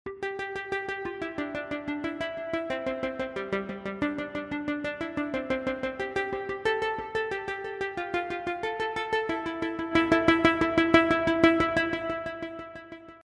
harpe effet; borodine

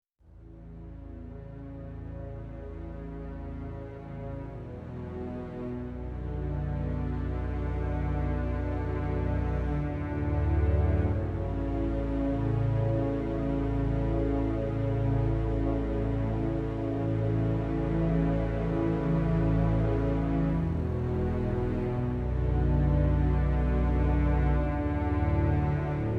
cordes

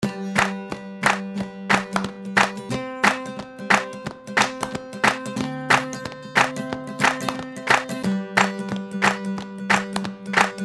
guitare perc

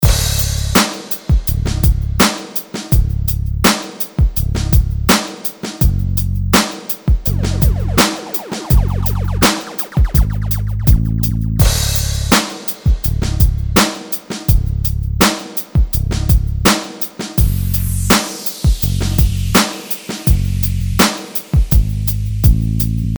rythme

